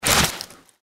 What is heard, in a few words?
gecko swing; npc